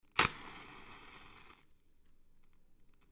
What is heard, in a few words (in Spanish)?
cerillos